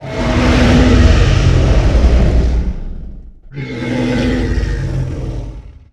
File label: Roar & Scream, Vocals Monster; Sony Pictures Sound Effects Series